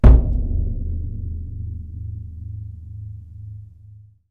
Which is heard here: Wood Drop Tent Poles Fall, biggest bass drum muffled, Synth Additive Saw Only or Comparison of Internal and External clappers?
biggest bass drum muffled